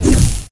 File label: batsaber swing; tf